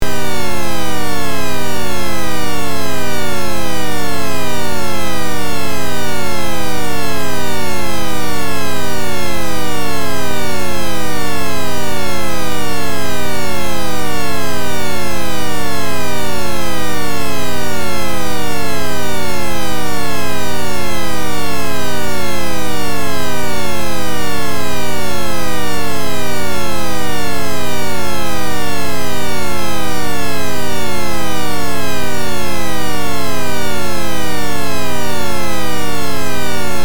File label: shepard down